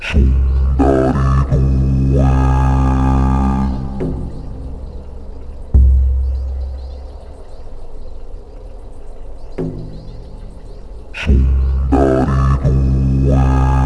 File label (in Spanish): INICIO ATMOSFERA